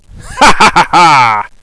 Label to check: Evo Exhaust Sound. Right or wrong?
Wrong